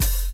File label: dark rap open hi hat sample f sharp key, Royality free open hi hat sample tuned to the F# note